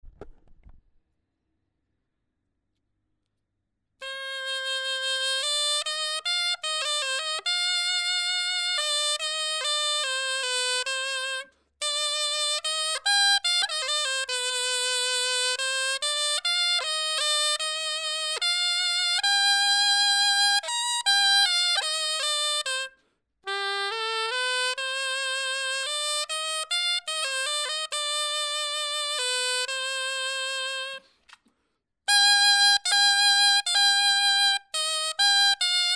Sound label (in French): clarin etude